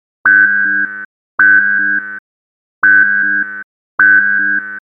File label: Blip